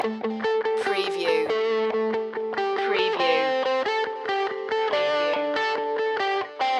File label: trashed underscore loop